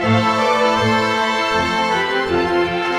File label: classic, ringtones; Google; NewWave Labs